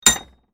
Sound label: Tool Metal Vise, Industry, Tool, Metal, Vise, Hand, Impact; Hand Tools